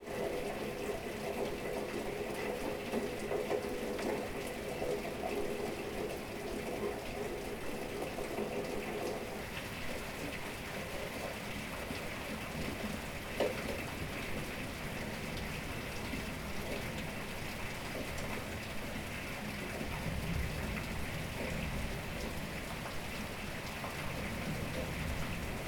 thunderstorm, ambience; NS Studios - ultra sound collection

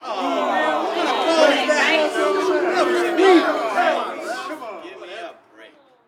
street ball crowd boo; Crowds

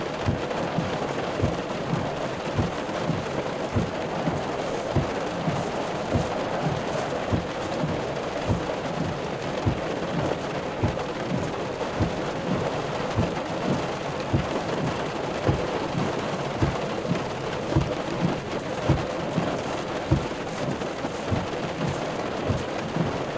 rain loop; Blind Drive

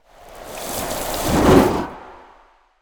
Ice Spell Summoning Calling Ice Spirits; Arctic Aura

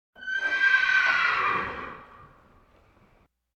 Animal effects snorts grunts farm ambience

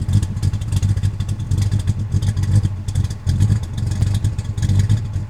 69 Mustang Idle Loop; Automobiles

